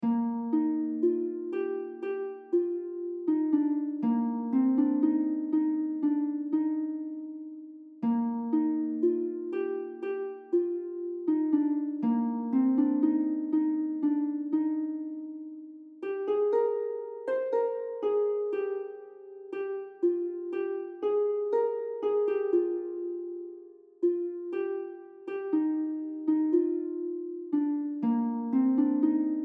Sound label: harp